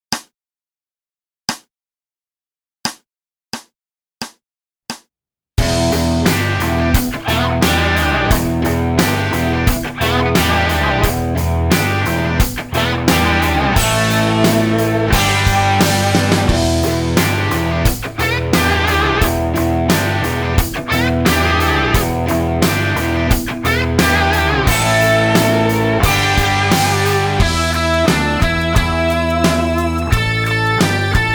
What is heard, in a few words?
rock armour plated backing track; initial stage